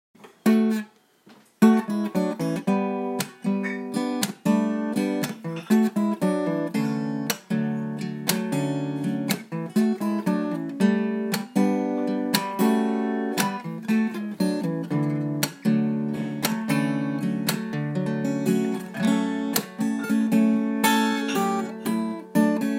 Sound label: gtr idea 70s